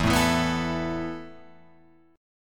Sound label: E Suspended 4th E, down, Guitar, Open F; Esus4 strummed; Esus4 chord